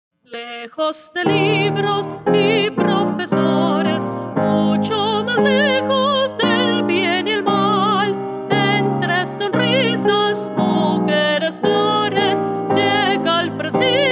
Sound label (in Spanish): Himno del Carnaval